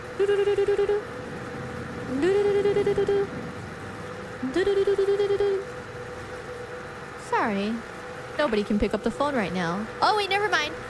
noises Turururururu

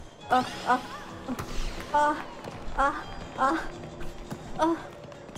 volleyball noises

